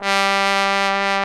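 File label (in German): posaune bass G2, trombone; Best Service; Alpine Volksmusik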